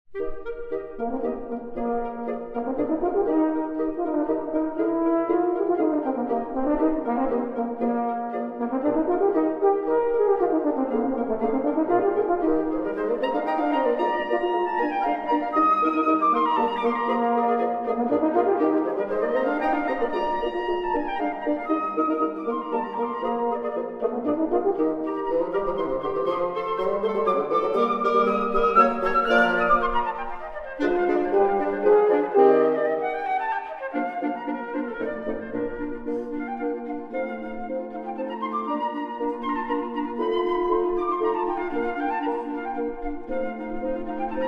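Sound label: wind quartet; gambaro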